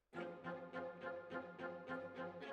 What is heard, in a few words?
Violas, jazz for piano